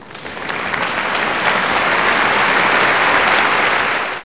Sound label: applause